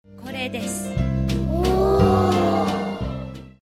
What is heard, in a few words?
Whooah